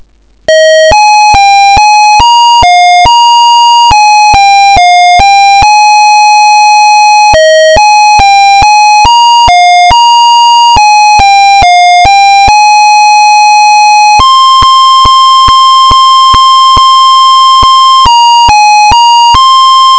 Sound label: melody